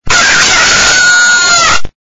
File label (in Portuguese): Vinheta Galo